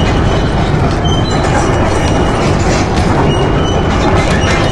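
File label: grinder loop; Source Engine; Portal 2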